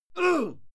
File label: male fight yell short